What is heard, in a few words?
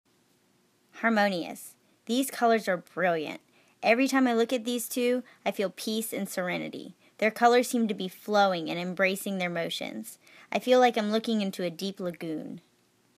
Harmonious